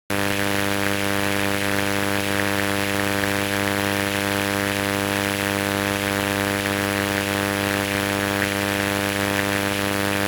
Electrical Interference Crackle Drone

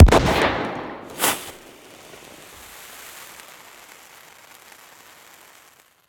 weapon smoke grenade launcher; BluezoneCorp; Detonation - Explosion

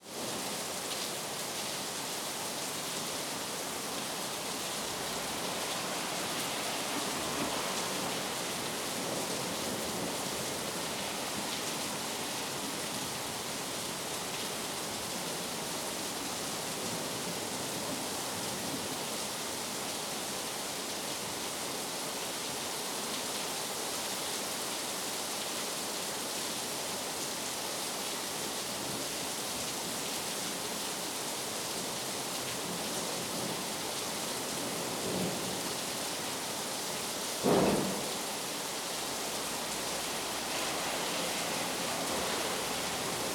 Weather, Rain, Heavy with Distant Thunders; AmbiX; Sonik Sound Library; Spatial Rain & Thunders

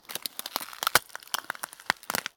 ice freeze crack; Foley Elements Ice and Snow

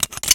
steampunk machine mechanical texture; BluezoneCorp; Steampunk Machines

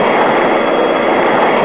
stinger fly loop, weapons; hl2

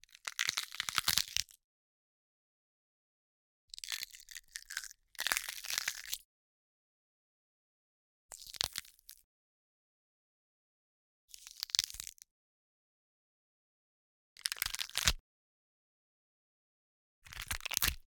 Bone Breaks Celery, Gore; Justsoundeffects; Gore Mini Pack

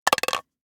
Little Plastic Container, Toys; Mechanical Wave

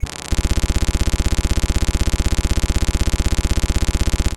retrofuturistic computer data processing; BluezoneCorp

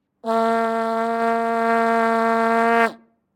Vuvuzela; TheWorkRoom Audio Post

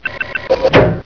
button; hl2; buttons

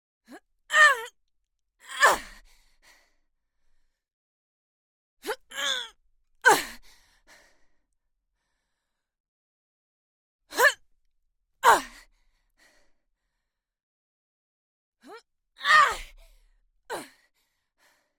Female, Grunt, Jumping and Landing, Long; The Grunts Library; Ehrlund M1

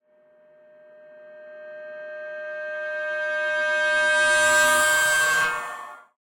Disturbing Risers, Cymbals From Hell; 344 Audio

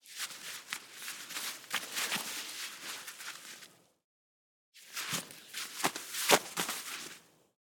Ski Gloves; Wavemotion; Ski Ride

